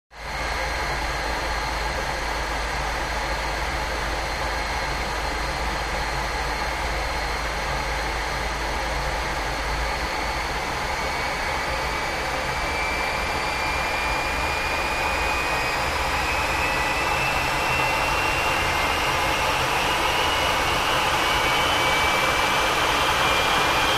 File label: Gnat: Interior With Throttle; Acceleration, Deceleration, Maneuvering Jet With Hisses And Whistles Of Engines. Close Perspective. Jet.